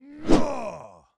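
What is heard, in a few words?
cavalry spear, weapon; Battle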